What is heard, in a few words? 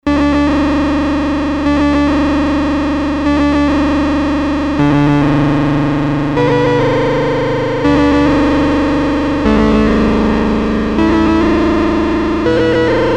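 synth computer, analog polysynth; Synth: menace; Solton Project 100; Solton SM100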